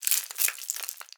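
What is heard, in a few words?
rattlebone, percussion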